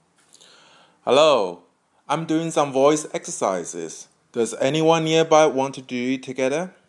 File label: voice exercises